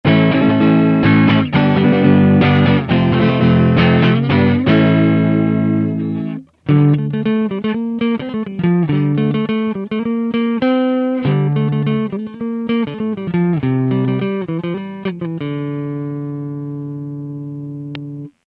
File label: ft clean